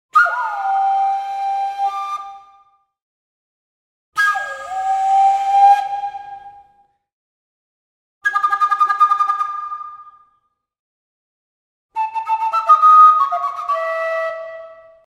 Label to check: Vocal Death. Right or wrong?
Wrong